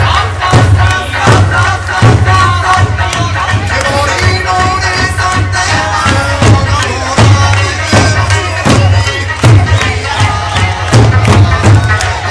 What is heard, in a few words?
drumming festival in Machida Tokyo